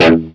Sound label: window new; jam; stereo